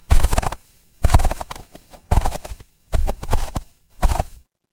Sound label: footsteps snow